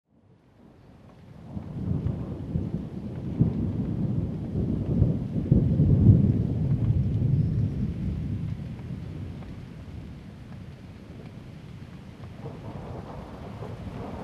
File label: Thunderstorm